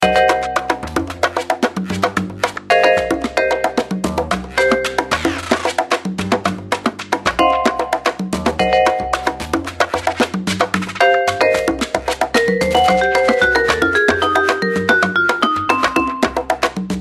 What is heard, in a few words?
Htc Polish, Ringtone; Htc 4